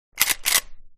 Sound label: blr cycle, guns